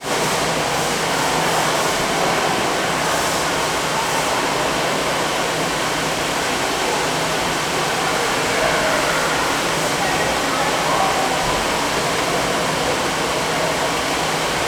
ambience swimming pool changing area people dryers; zapsplat